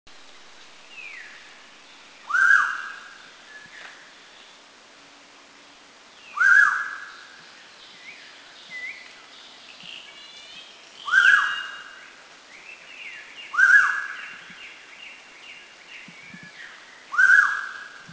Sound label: Hooded Pitta Subic; Philippines